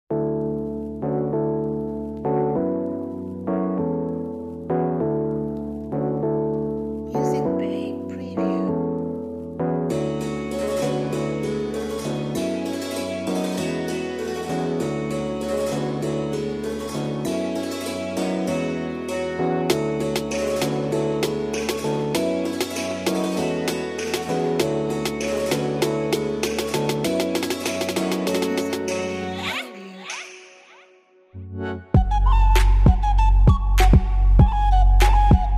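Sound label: Ukrainian Vesnianka Trap Instrumental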